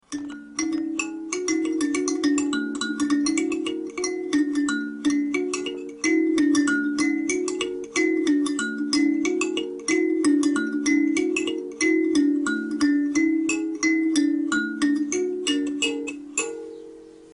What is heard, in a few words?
sanza